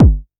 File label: clear kick g sharp key